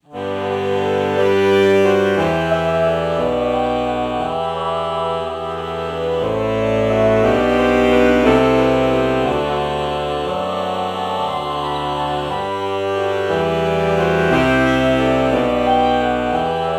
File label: alto saxophone ah morph